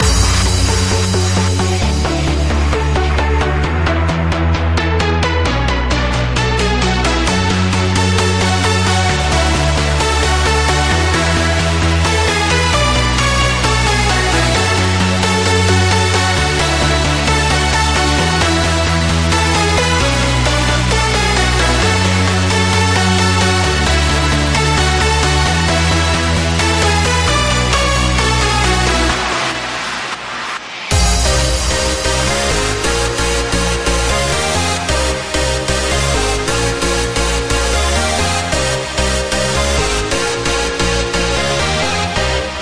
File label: FUTURE HOUSE; ESSENTIALS FOR SPIRE VOL 1